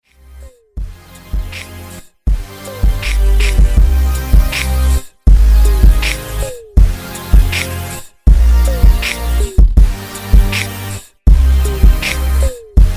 beatbox symphony snippet

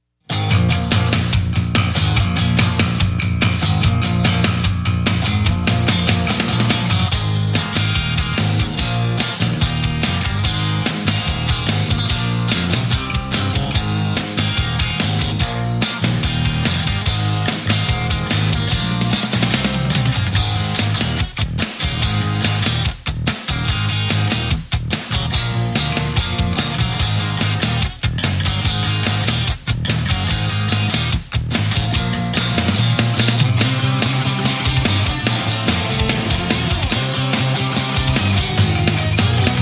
sg g90 b m75 vox ac 30

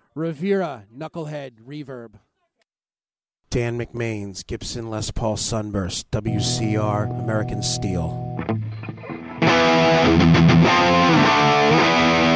rivera knucklehead reverb bridge

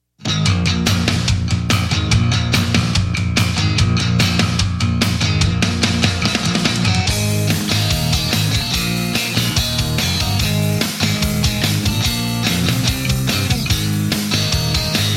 vox ac 30

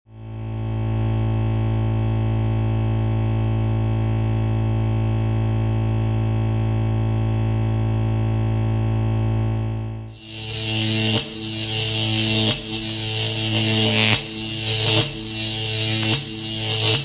electrical noise sound effect in high quality

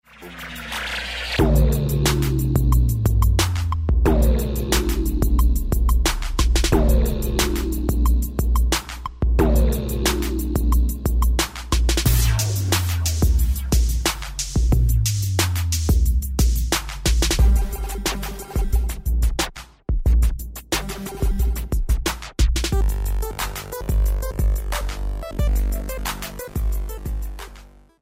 boom

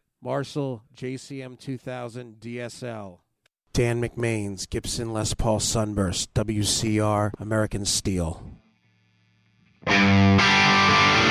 marshall jcm2000 dsl bridge

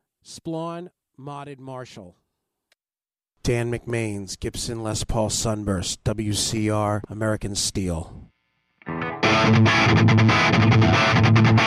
splawn modded marshall bridge

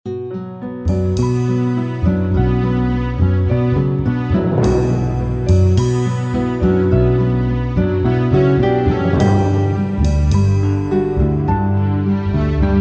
orchestra

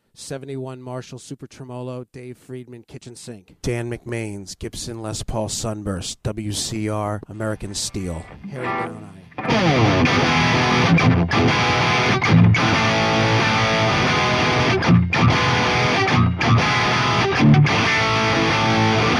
marshall 71 super tremolo bridge

